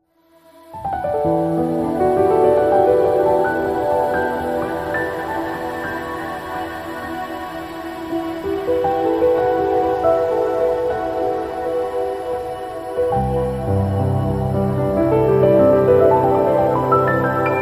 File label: relaxing